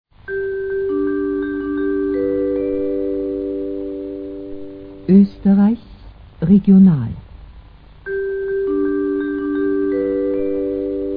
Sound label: interval signal; orf regional 1980